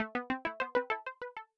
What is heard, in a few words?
SYNTH SEQ (louder); Vostok Inc.